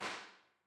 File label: Royer 121, Impulse responses